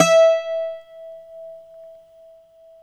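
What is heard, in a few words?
Bouzouki E 3, Mediteranean Traditions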